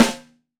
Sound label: Std. Snare, Roland BK-5; Snares